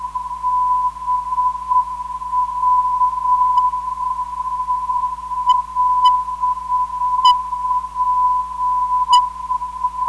275.48kHz, ndb

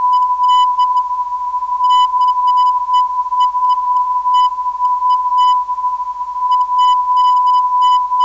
356kHz, ndb; CVU

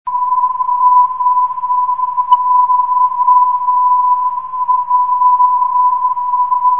490kHz; SVN

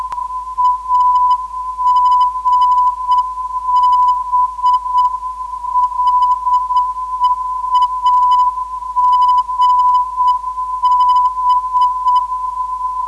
318kHz, ndb

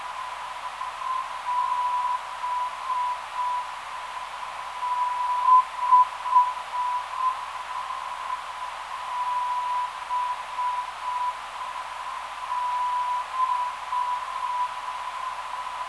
437.10kHz, ndb

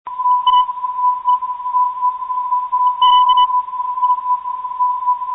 444.5khz, ndb

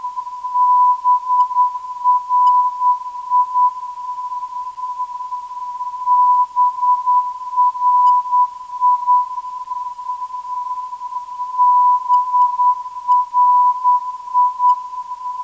471.01kHz, ndb; BRI

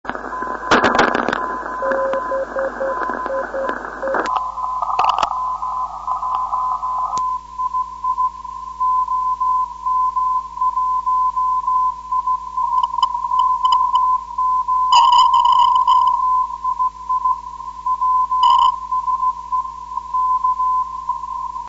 472.5 kHz

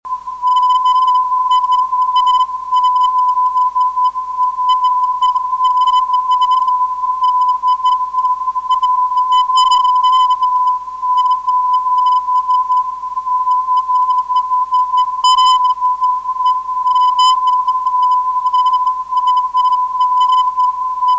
472.3 kHz

